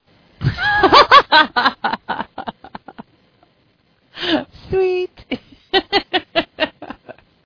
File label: Laughing Sweet